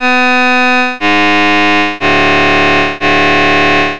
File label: artefacts